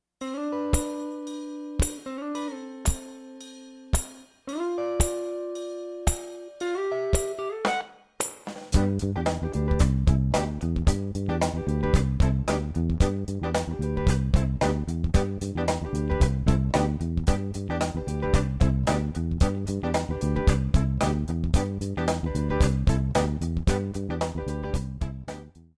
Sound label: R B Bass